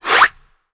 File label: Toss Balloon